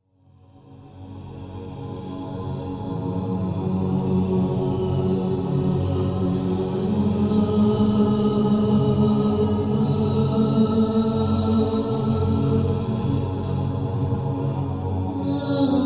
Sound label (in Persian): Vocal Ehsasi